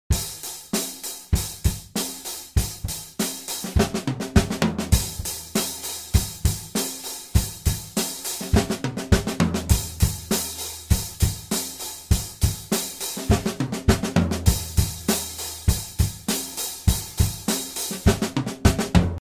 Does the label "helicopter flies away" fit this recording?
No